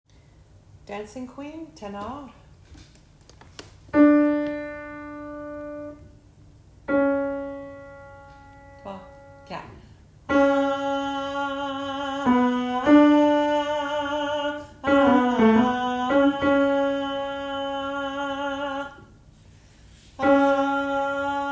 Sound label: tenors